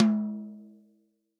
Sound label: TOM, Real Drum Kit, POP KIT; REAL DRUMS; Best Service ProSamples vol.43